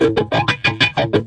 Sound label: wokky guitar; GUITAR LOOPS